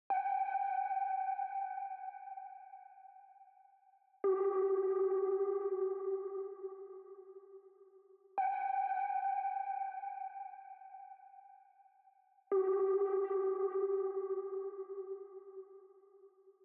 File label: 116 BPM G Min Lead, Loop Stems; Cymatics; 2022 Melody USB Expansion; Needs